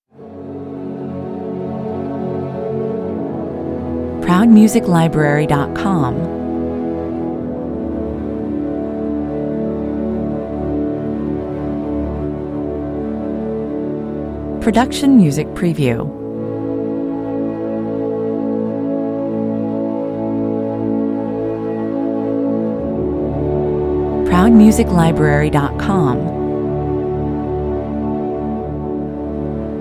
strings only

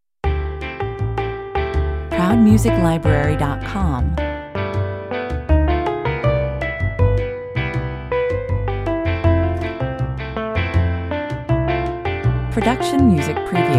jazzy guitars

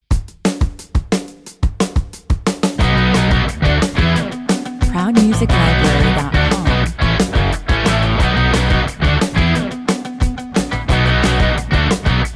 speed shifter 180 bpm

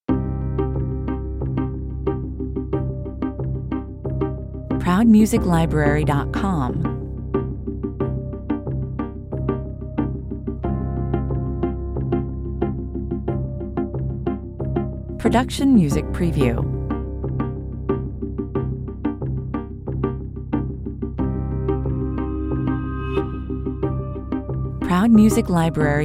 no drums perc

